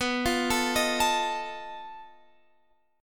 B 7th Flat 5th B Guitar Half Step Down

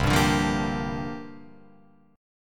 C 9th Suspended 4th, Guitar, Open F; C9sus4 strummed

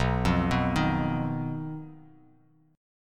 B Major Flat 5th B, Bass Standard; BMb5; arpeggiated; 7,8,9,8

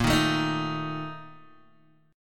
Bb Major 7th Suspended 4th, Guitar, Open G; x,3,1,2,4,1; 4D,4G,5D,5G,5B,6D; strummed

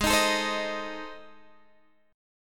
A Minor Major 7th Flat 5th

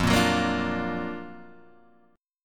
F sharp Augmented Major 7th F

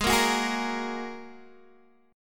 G sharp 7th Suspended 2nd G sharp Guitar DADGAD

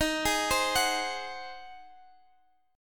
G sharp 7th D sharp

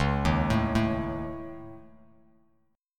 Db Suspended 2nd Sharp 5th Db Bass Standard; arpeggiated; Dbsus2#5; JGuitar

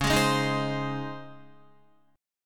C sharp Major 7th Suspended 2nd C sharp, Guitar; strummed; chordshape; 8,11,8,8,8,8; JGuitar

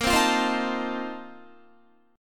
Bb Major 7th Suspended 2nd Suspended 4th, Guitar; strummed

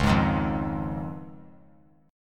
C sharp Major Add 9th; C#add9 strummed; JGuitar

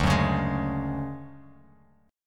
D-Major Sharp 11th, D, Bass Standard; strummed; JGuitar

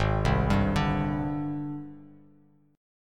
G sharp Major Sharp 11th, Bass Standard; G#M#11 arpeggiated; JGuitar

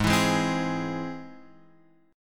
G sharp Augmented G sharp Guitar Open F; G#+ strummed; JGuitar

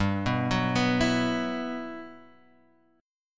B Suspended 4th G, chord; Bsus4/G arpeggiated; JGuitar

